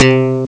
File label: flick bass; Amiga Soundtracker Sample Packs